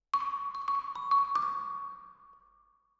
Vibra Close, Jazz for piano

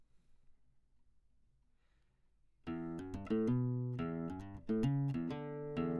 Classic Guitar

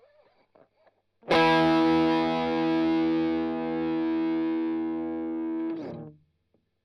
Strum Line

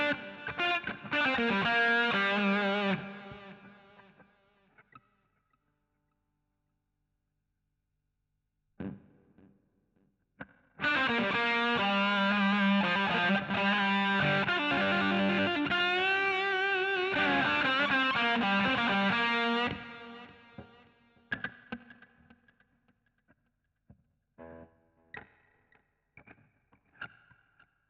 GTR A Solo

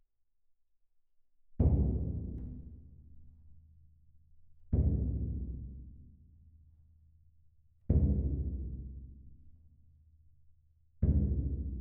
Perc

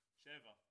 Ride

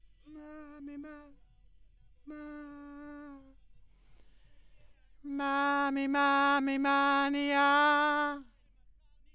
B. Vox

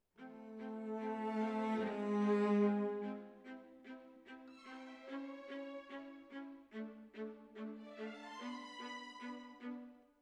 Cellos

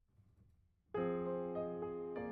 Piano B

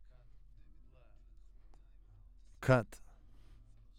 Voc OD

